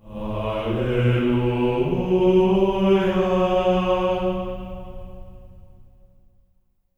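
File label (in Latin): alleluia B3; Spectrasonics; Symphony of Voices; GR ALLELU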